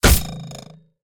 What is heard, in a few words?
map knife hit; rage of the seas